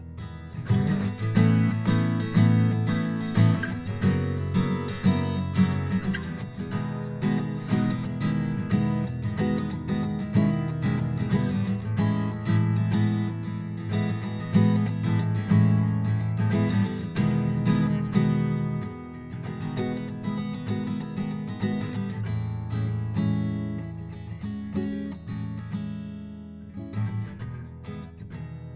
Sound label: takamine room; tc electronic konnekt 6